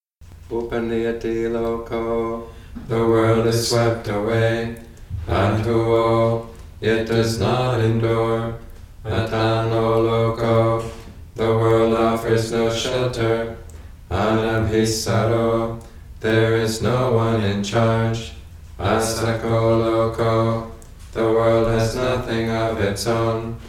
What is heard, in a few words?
Chant Four Dhamma Summaries